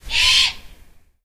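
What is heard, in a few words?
rural random screech owl; random amb sfx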